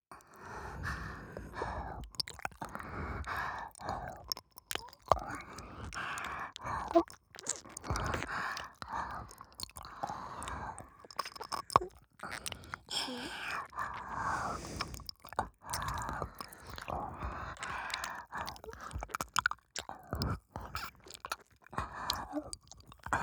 両耳舐め; 二人きりのお家デートばい♪２; 方言姉妹おまけ

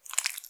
膣外射精

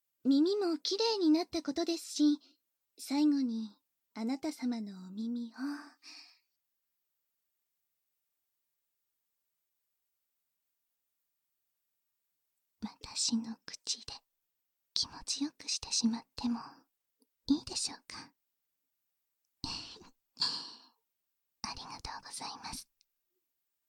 耳舐め 手コキ（バイノーラルマイク収録 効果音あり）; バイノーラルマイク収録のみファイル

